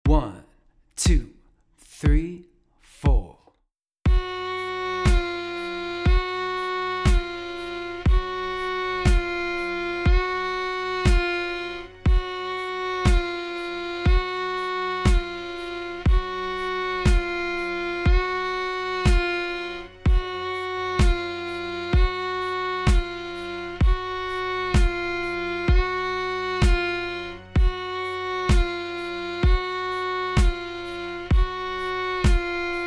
Vibrato, fiddle; art of fiddling

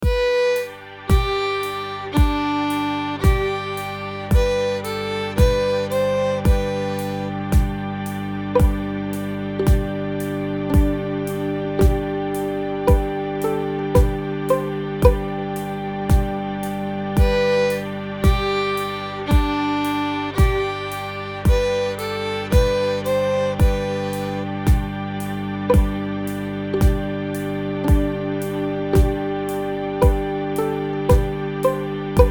practice loops fiddle and plucking

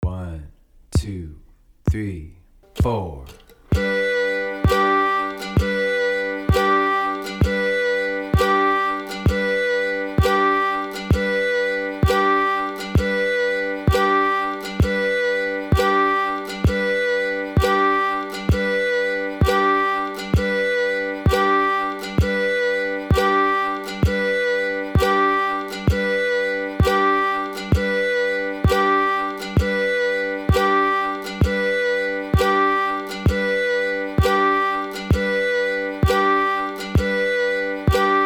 practice loops first two notes, fiddle

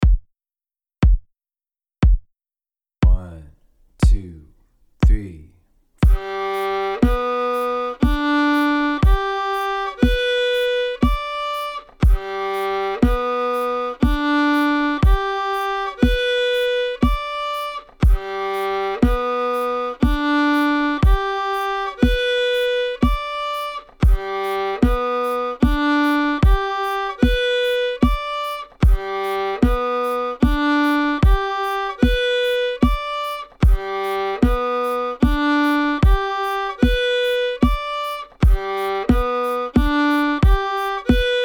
G triad two octave, fiddle; scale central

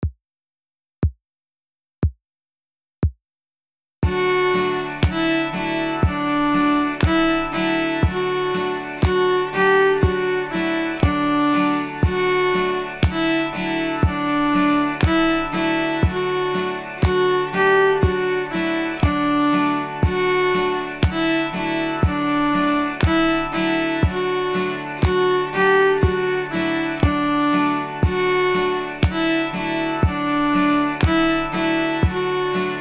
hoedown lower octave, fiddle; mary had a little lamb hoedown; getting plucky

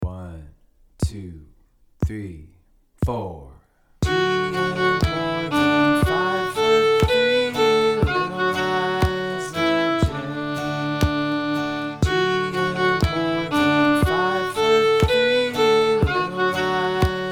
Fiddle; Little Liza Jane; beginner fiddle lessons; the d major scale

